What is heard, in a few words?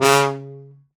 tuba second line blast